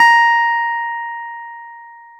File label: Clav DA A#6, Clav; Keyboards of The 60's and 70's